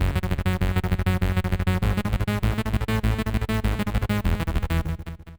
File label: bass arp